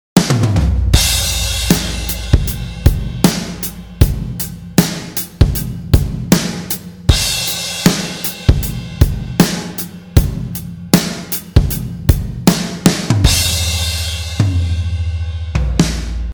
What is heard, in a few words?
RVerb